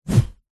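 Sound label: large stick bamboo swing swipe whoosh air; zapsplat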